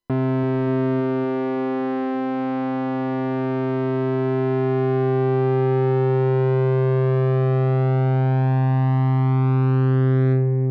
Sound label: Moog